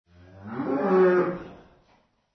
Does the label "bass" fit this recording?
No